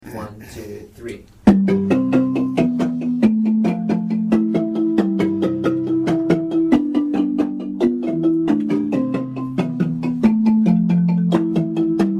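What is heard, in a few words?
baritone and tenor, marimba; chikende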